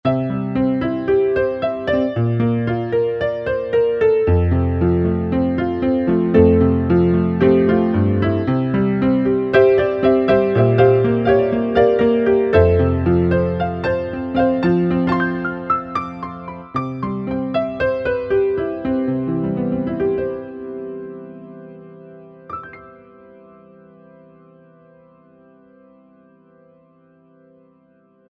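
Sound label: Clavinova; Clavnova Chr; Sonic Reality Modern Songwriter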